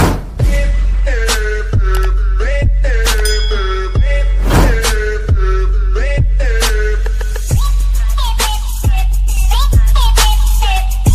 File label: beautiful english ringtone